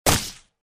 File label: Sfx Archer Attack Hit